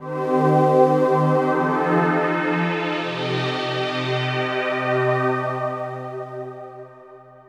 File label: SYNTHPAD AMBNT 160 C; eJay; Ambient; Sphere